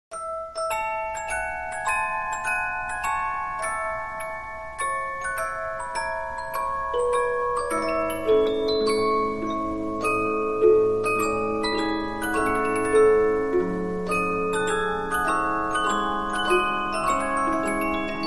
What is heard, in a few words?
Polyphon, 15inch disc musicbox